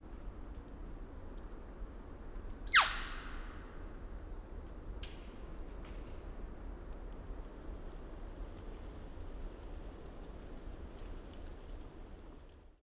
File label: Tengmalms Owl Tsyuck call at close range